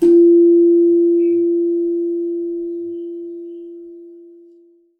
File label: slanthem; Saron